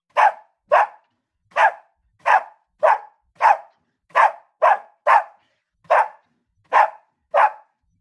dog barking, energet

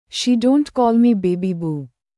Baby Boo

